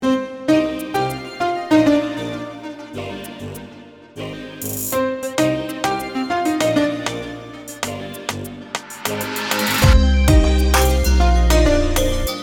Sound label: Trap